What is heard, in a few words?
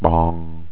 bong